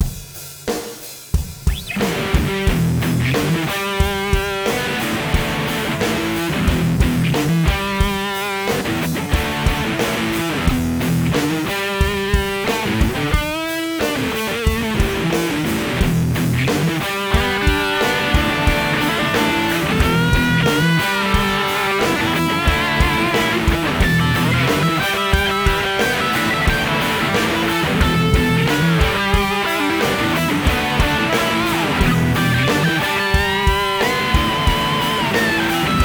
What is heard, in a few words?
disto; washburn pilsen idol